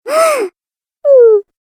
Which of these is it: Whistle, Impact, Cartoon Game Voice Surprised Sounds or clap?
Cartoon Game Voice Surprised Sounds